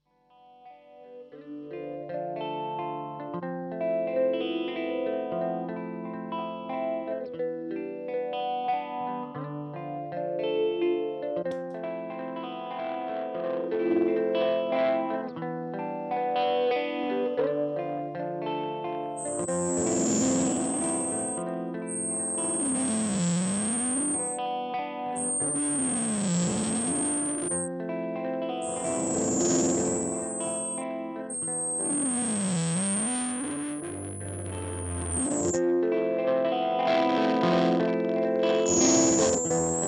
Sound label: Phaser; death by audio total sonic annihilation